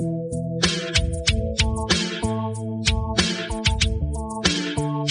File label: industrial newwave